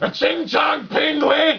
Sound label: pain, controller